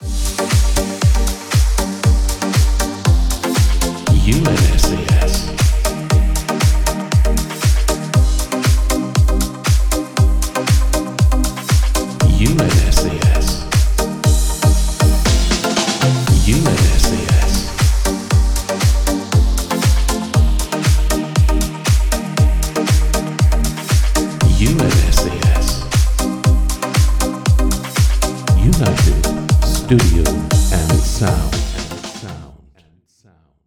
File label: Jingle; UNSAS